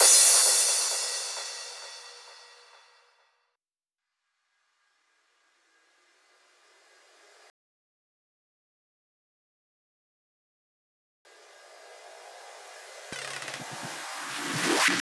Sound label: Drum Loop 128BPM FX; VEC5 Drumloops; VEC5 Multi Loops 128BPM; Loop Elements